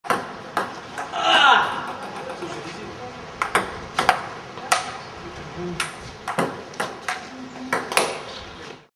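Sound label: ping pong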